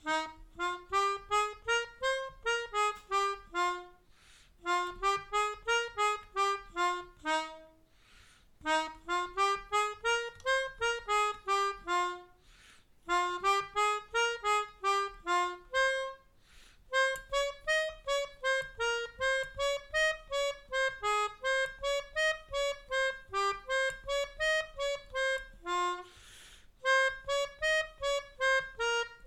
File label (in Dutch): Mijn Melodie, Melodica